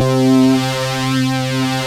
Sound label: FAT FUZZER, TECHNO; Pro-Rec - Synthsonic; Z1 TECHNO